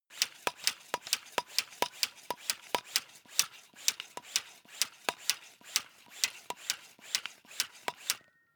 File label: air pump small sequence fast